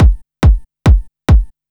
Kik Loop, Dance, Beats

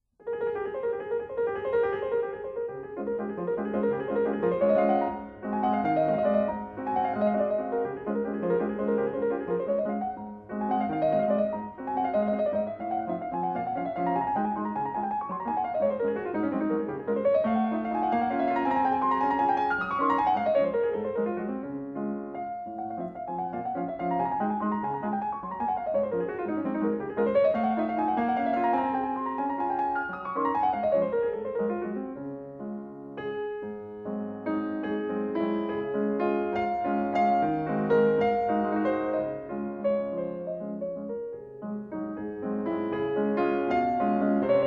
Waltz for piano No. 6 in D flat major; 'Minute' 'Dog Waltz'; Op. 64 1, B. 164 1

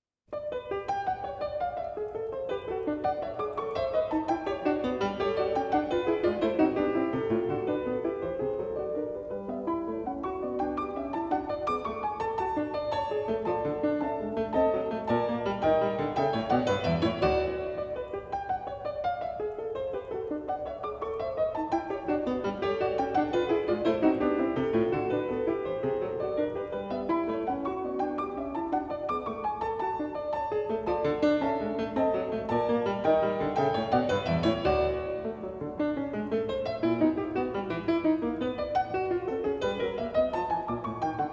Gigue for piano in G major; Mozart; Eine kleine Gigue; K. 574

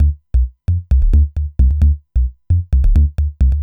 bass, dance

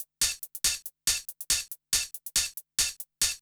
Hi Hat Loop, Dance, Beats

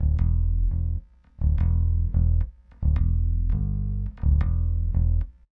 Metal Deep Bass; Rock

